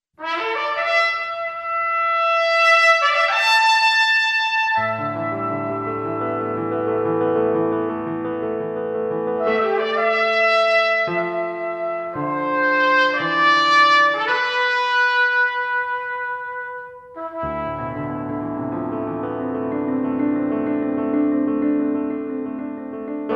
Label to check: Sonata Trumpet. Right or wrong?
Right